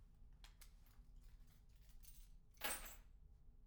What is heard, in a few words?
keys; IEEE AASP